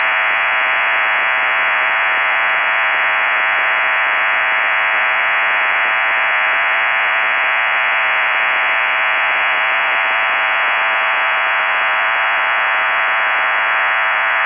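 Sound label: CIS 20x 75 Bd (PARALLEL) tone, AT-3104 TYPE MODEM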